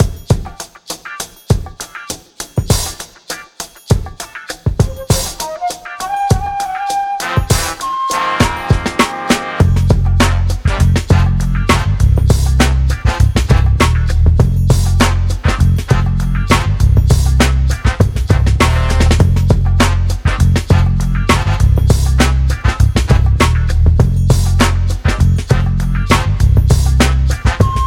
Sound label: Lounge; LANDR